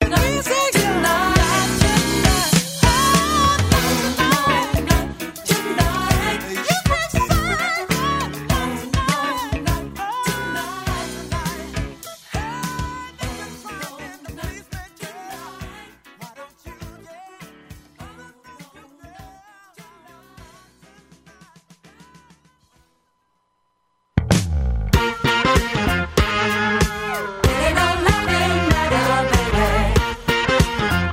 jazz

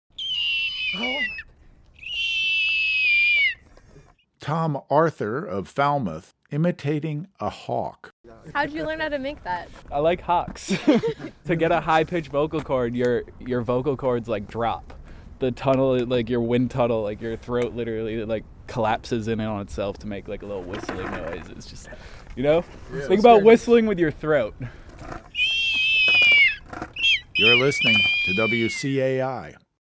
hawk whistle

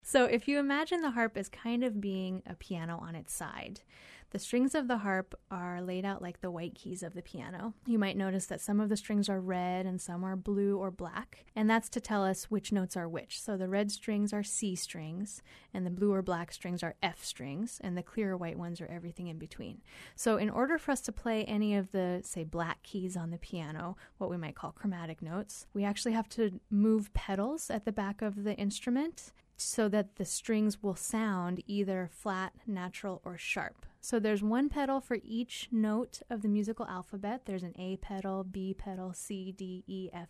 harp

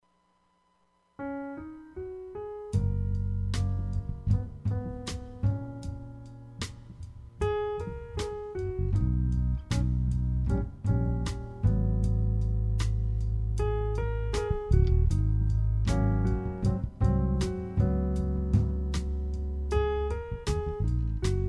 jazz

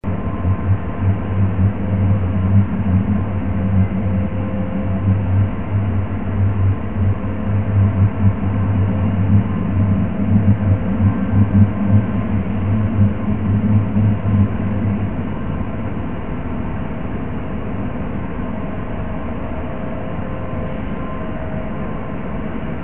pulse windsor hum; traacy smaliton 2012

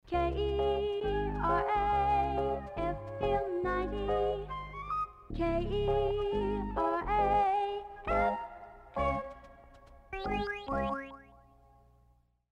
Jingle; KERA; 1975

